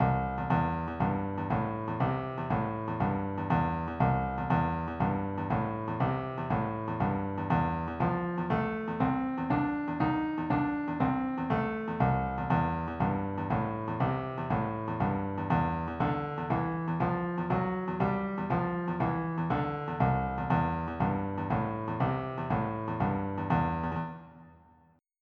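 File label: shuffle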